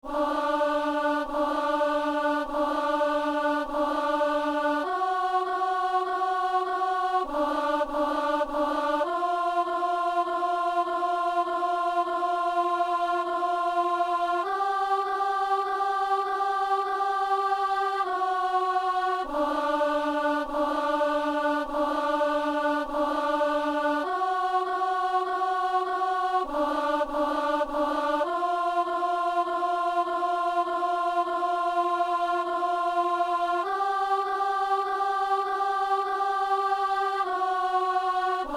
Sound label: alto; Messe de San Lorenzo - Agnus